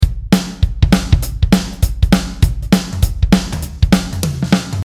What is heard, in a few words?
swing rock bpm 200